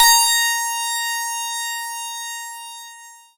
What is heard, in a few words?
PLUCKED, Synclav FM, A#; Big Fish Audio